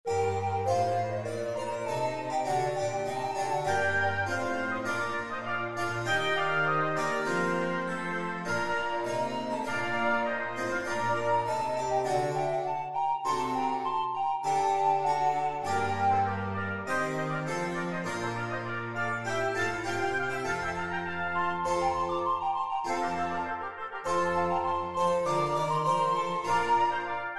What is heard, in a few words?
Sonata in G Minor